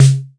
Yamaha TX-81Z SD; drum machines

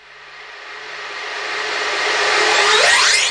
Electro FX; Ueb HE Electro FX

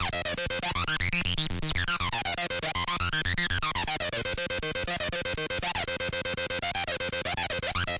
120 aggressive mono synth; SYNTH AND ELECTRONIC LOOPS

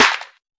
Lad clap; Claps

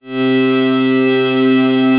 Synthesizer Middle C Notes, Lead

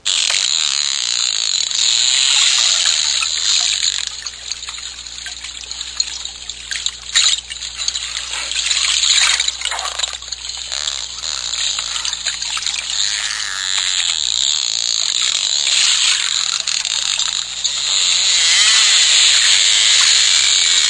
dolphins underwater; Zoo and Nature

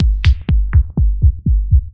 kick filter descending, drum loops and breaks

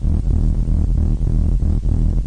idle, video games; turbo

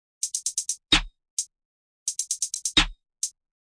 simple trap hat n snare, drum loops and breaks

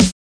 melbourne bounce snare small; Hip Hop; STYLE KITS; D3ron Melbourne Bounce Kit

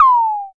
electro perc; Hip Hop; Precise Electro Percussion; style kits

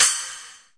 thin splash, cymbals; DRUMS (SINGLE HITS)

